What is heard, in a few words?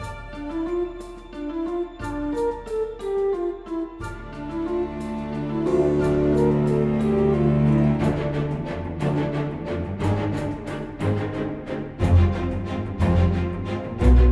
story pirates; Shadowhand; macos windows gamerip 2017